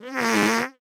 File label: fart hard